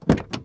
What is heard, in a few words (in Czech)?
dvere; Mafie 1